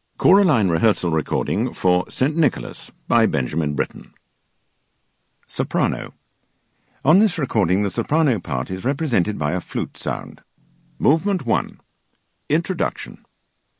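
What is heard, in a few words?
Soprano; Britten St Nicholas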